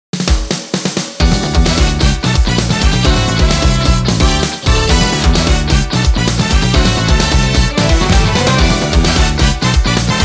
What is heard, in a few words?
Music Menu event